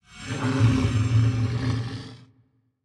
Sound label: boss main, monster; Sphinx